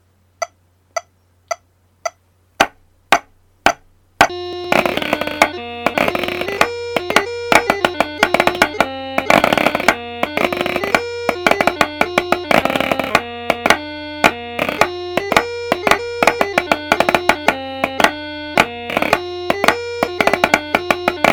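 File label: Highland Fling Chanter Snare; North Berwick Pipe Band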